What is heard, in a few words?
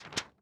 real reel bounce; netent